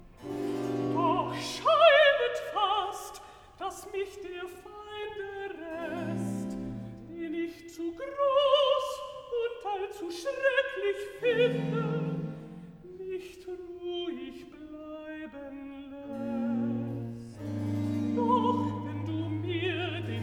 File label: Recitative, Alto; Bach, J S Cantatas, Vol 23; Halt im Gedächtnis Jesum Christ, BWV 67; Doch scheinet fast